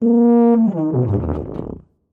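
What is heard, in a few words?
tuba sounds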